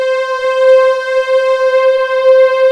NEW AGE VOX, JV PADS; Pro-Rec - Synthsonic

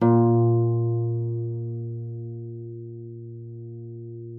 harp forte; Xsample VOL-8

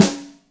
Beauty, Yamaha DTXpress Acoustic Snare

